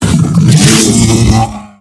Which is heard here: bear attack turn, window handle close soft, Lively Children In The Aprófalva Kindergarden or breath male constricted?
bear attack turn